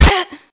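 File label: bullet hit flesh female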